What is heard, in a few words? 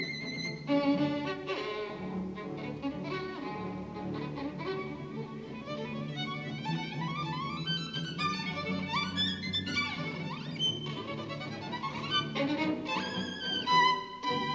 Violin Concerto in D minor, Allegro ma non troppo; Sibelius; Op 47; Jascha Heifetz